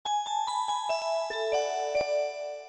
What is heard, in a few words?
Sms Tones Extra